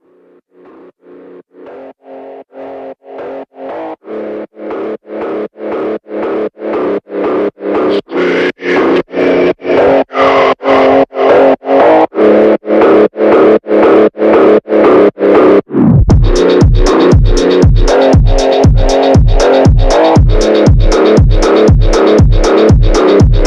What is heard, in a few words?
slowed reverb phonk